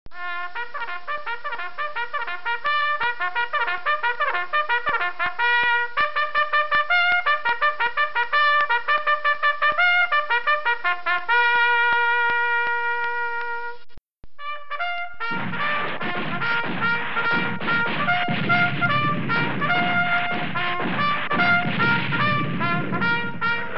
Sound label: Sound War Trumpets